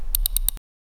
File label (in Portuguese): passar o rato no menu; vfx